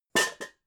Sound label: pots stack